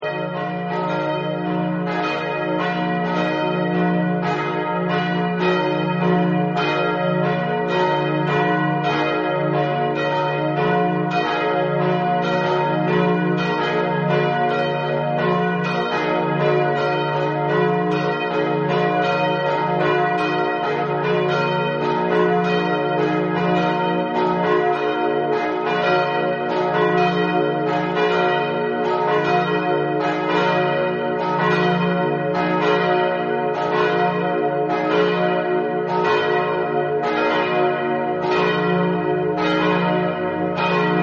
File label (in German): gelaeut; petrus